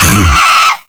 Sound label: pain on fire, grunt; Quake 4 Alpha; strogg